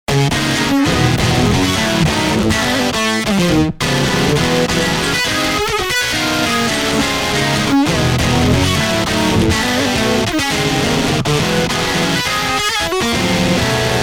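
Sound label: solo guitar; bremerton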